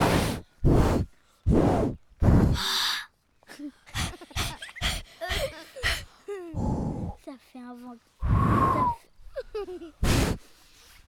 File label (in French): bruitages